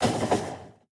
train tie; factorio; Factorio 0.14.21

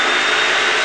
SAW DOWN

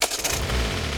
car engine start; factorio; Factorio 0.14.21

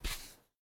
sand, walking; factorio; Factorio 0.14.21

